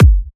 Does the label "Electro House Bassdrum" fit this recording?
Yes